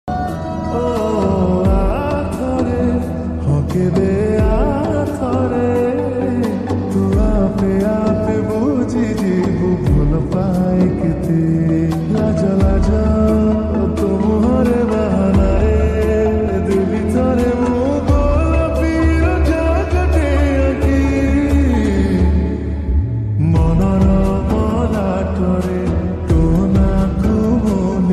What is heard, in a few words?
Odia Ringtone